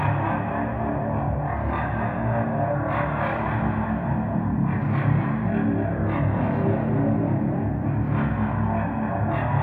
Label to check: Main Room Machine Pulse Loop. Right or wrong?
Right